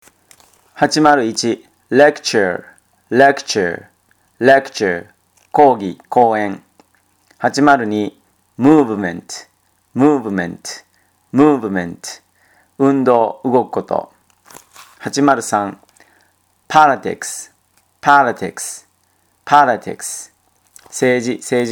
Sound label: tango